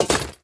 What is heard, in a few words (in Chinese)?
装备下界合金盔甲声